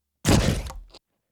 comic weapon blast liquid burst squirt drip

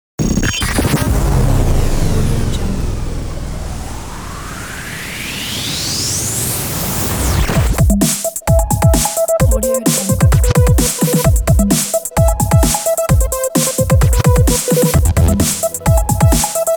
radio jingle ident

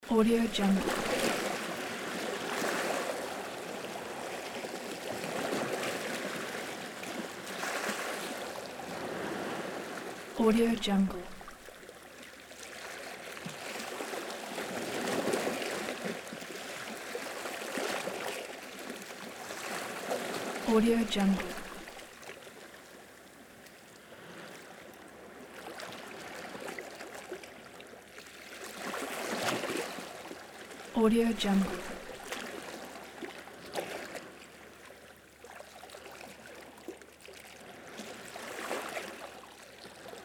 soft waves

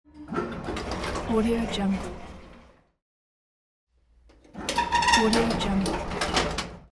old elevator door

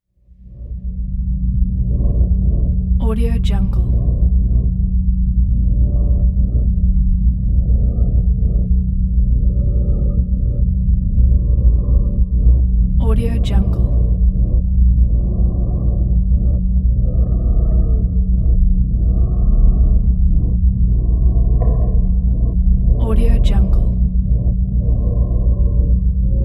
dark scifi drone

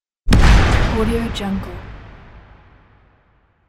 trap; 3dhome

